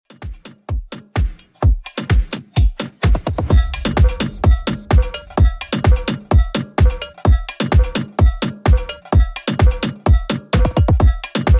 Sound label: Afro Redrum 128bpm